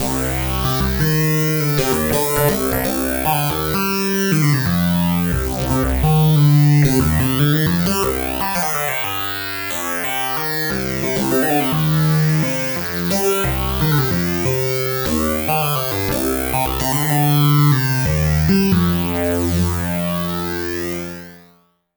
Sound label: Warped Notch